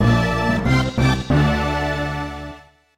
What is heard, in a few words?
fanfare